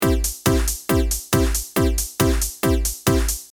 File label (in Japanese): シンセバッキング